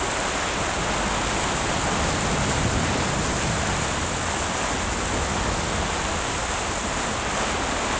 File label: water sea storm; Pirates Of The Caribbean